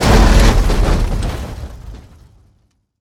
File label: Veh Hit, Damage; DCS